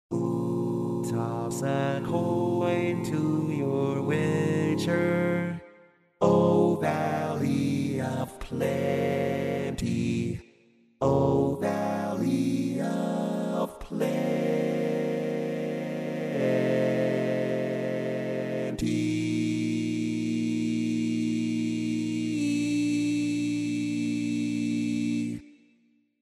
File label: All Parts, barbershop